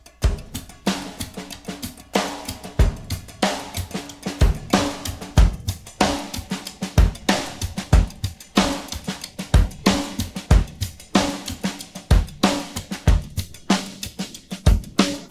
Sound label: pattern, drums